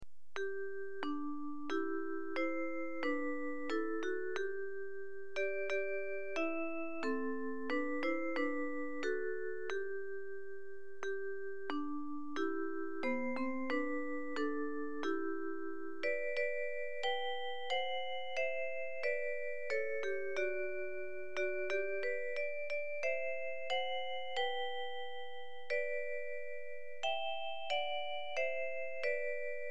music box; Hannuka; maoz english

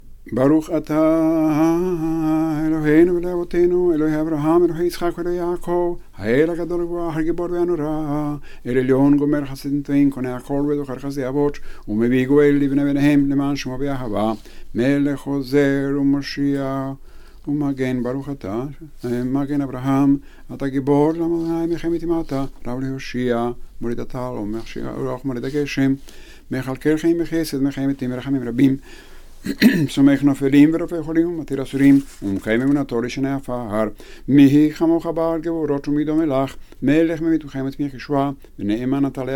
Amida opening kedusha, Weekday Minha

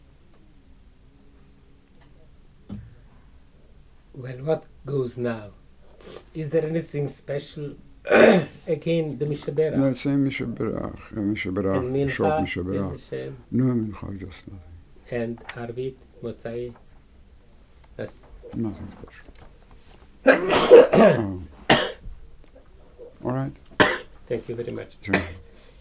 concluding note; Shemini Atseret

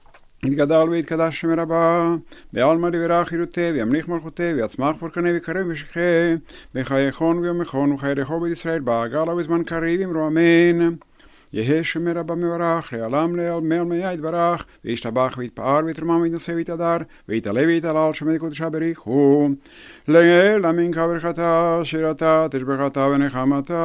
Half kaddish, Sephardi music

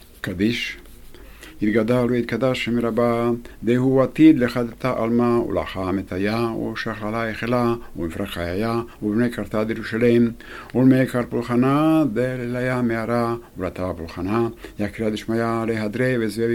Kaddish dehu atid

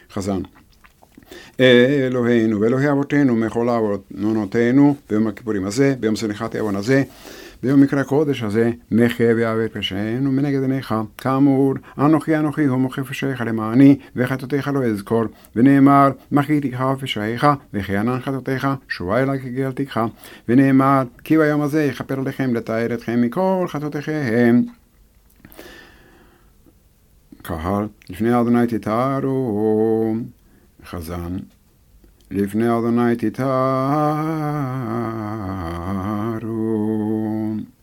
Elohenu mehol leavonotenu; Kippur Shaharit

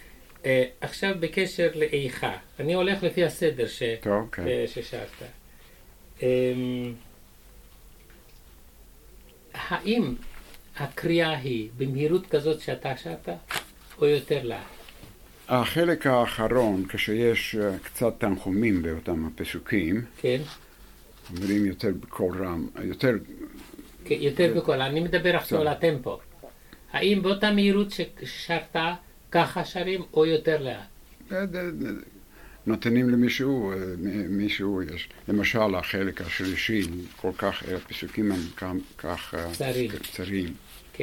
Ekha recitation; 9 Ab Arbit

